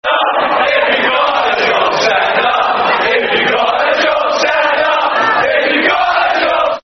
Stand Up If You've Got A Job, Football Chant; West Ham WHFC

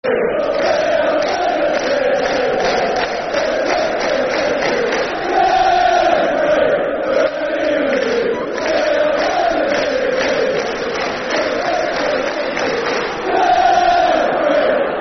Football Chants (Soccer Song); Chelsea; fanchants-com

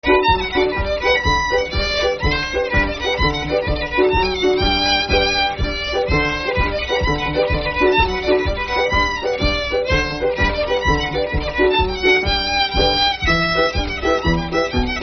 Champion Jig

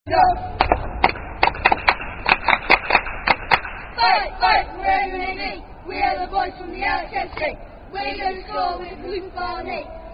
Chants; The Alex Zone 3

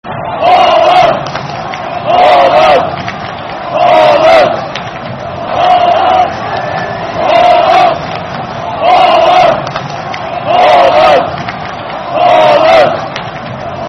(Clap, Clap) Football Chant (Soccer Song); Holland; fanchants; Holland Hfa Netherlands

